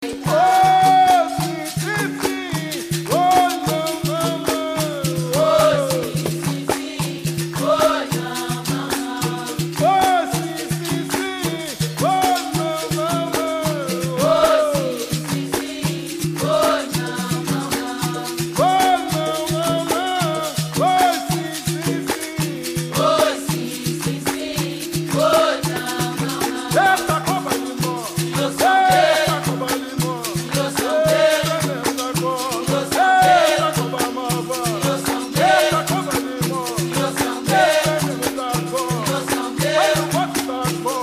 capoeira girl; boa voz

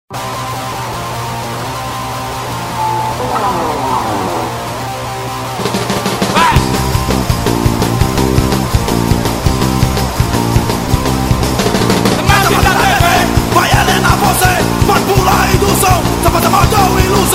Overdrive PUNK HC

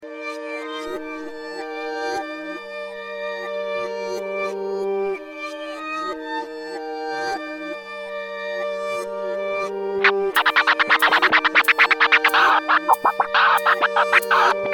rap

